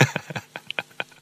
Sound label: laugh